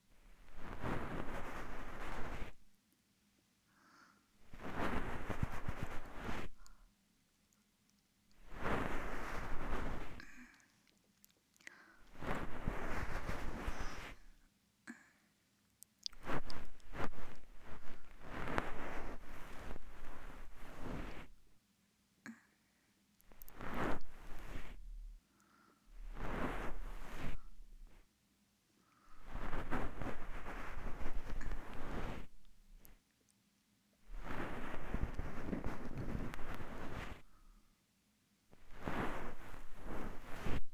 梵天 エンドレス 右

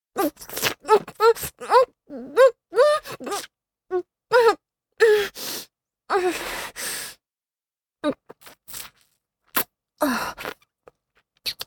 口内射精→飲精, チュパ音

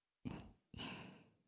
ふふっ; 愛好化(褒めまくり)

